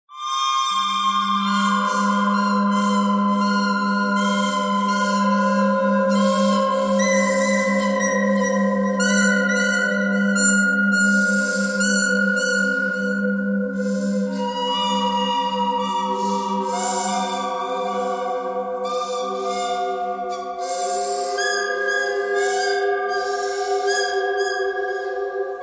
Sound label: bird canyon bird thread pentatonic